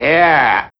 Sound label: Vocal Yeeeah